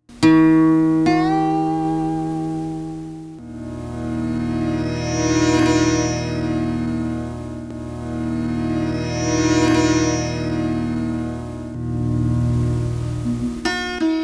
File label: tune sitar harp; multi-stringed fretless harp-sitar